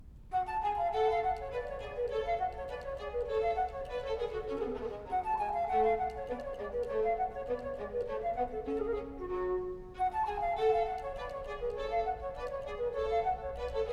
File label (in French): Gavotte vive; suite d'orchestre; Jean-Philippe Rameau; Dardanus